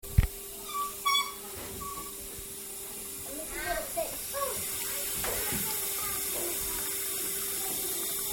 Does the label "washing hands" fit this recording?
Yes